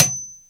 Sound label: ANVIL; Greytsounds; INDUSTRIALFX